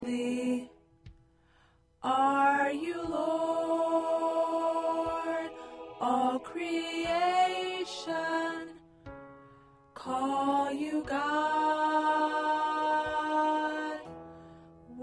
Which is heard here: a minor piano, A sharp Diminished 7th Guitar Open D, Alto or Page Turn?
Alto